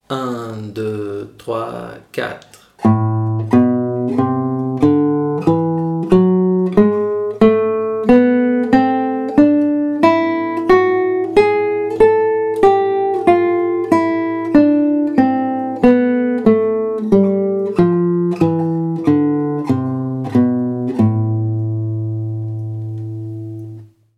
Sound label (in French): Gamme de La mineur Mélodique